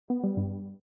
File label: left call; element-call